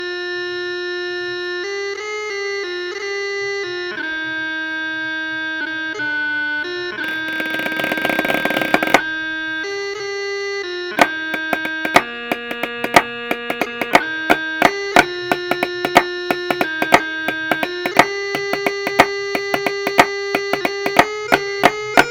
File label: Snare Chanter